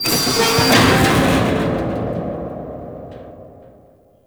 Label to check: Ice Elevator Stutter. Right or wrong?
Right